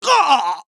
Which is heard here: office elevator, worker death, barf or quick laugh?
worker death